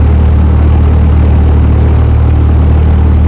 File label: SOUND BLIMP; PC Gamer 4.2; mtm2demo